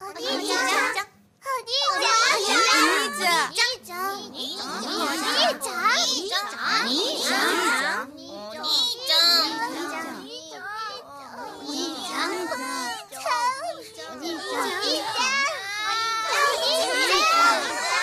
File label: ringtone